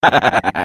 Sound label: laugh, ru; Defective Turret; sp sabotage factory defect